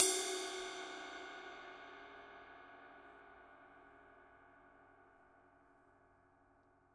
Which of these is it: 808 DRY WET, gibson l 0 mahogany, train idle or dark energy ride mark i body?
dark energy ride mark i body